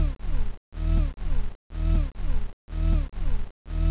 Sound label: ship cloak